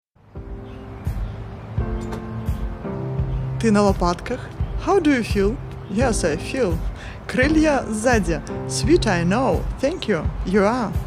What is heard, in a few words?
LAUGH; полетаем